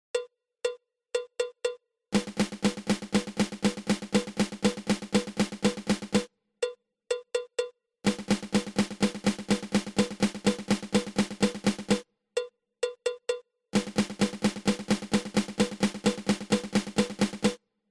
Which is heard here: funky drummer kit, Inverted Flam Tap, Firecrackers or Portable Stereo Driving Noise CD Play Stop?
Inverted Flam Tap